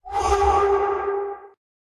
Dark Spirit Death

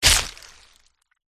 crab attack contact middle

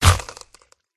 bat attack contact bottom

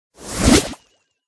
hydra violet

